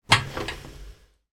portable heater push movement, household

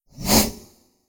Transition Whoosh SciFi; bottlerocket

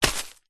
footstep single shoe wood chippings pile; foley; zapsplat

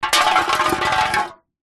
food drink soda can empty spin wood deck

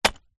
footstep single bricked ground; foley; zapsplat

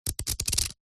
household book very small pages flick through

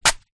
foley footstep single flip flop dirty concrete

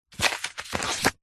household book thick hard back cookery page turn

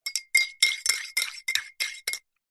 stir coffee mug spoon; food drink; zapsplat

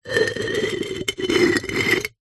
household pot ceramic base lid scrape

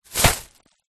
shopping bag plastic full put down; foley

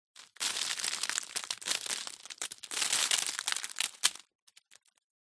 foley debris dust dirt fall land plastic sheet

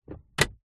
transport car glove compartment close

